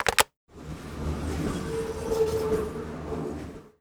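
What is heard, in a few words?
button on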